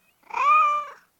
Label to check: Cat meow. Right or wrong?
Right